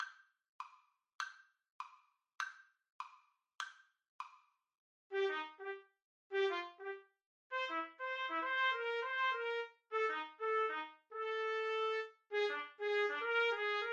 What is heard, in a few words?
silver sand rag trumpet duet